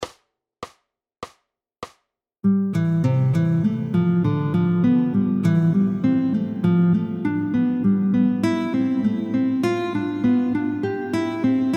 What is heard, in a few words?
Guitar Gym Sequencing oefening